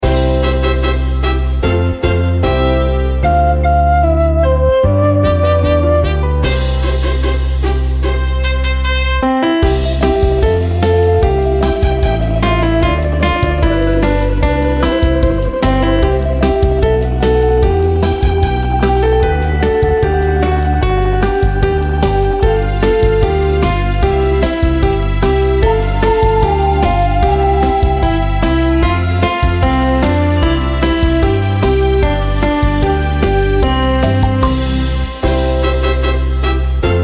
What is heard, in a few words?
karaoke